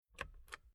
Transport Car Classic Dashboard Oil Button On And Off; Bentley Eight